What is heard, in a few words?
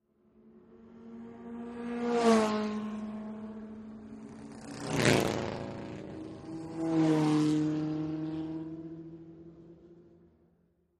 Vintage Car Race Bys; antiques